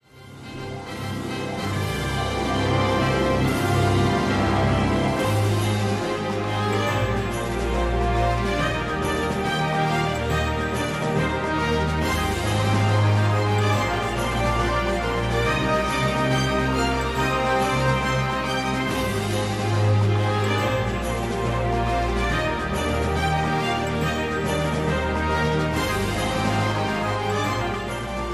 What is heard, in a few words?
Quest Victory Fanfare